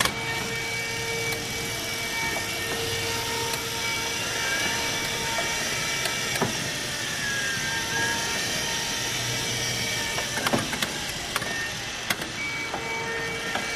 Electric Wheelchair Start, Maneuvers With Switches, and Stop